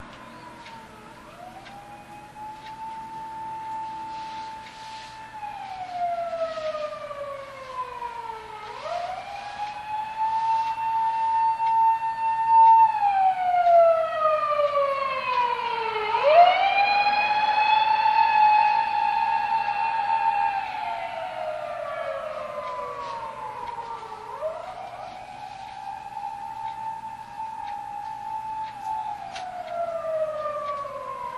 bath siren; misui afterend

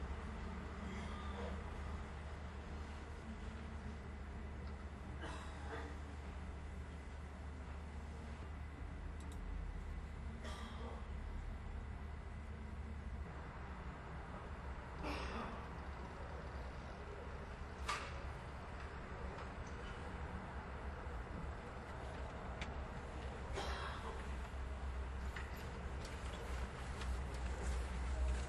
bath bike go

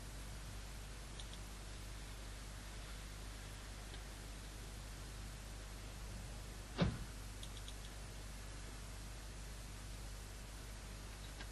window close

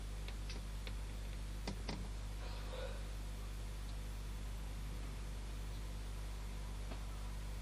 car parking

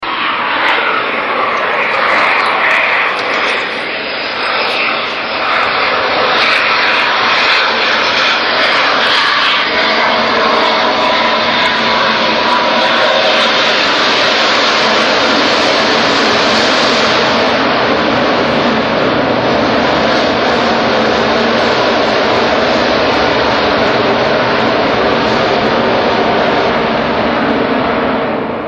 air noise

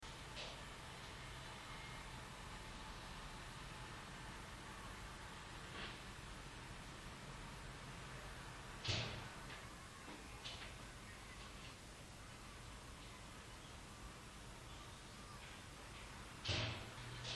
genkan open close

